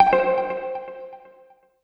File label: Notifications and Buttons